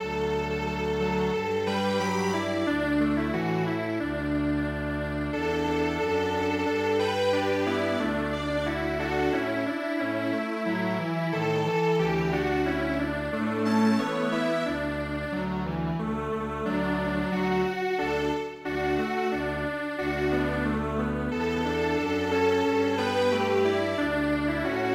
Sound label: Strings Quartet